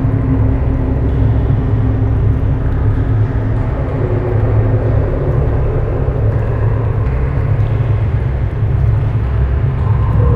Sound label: City Silo Amb Loop